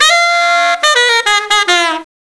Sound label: sax; Sonic Foundry; Crimson Blue and Fabulous Horncraft 4 RnB; OntheJazzTip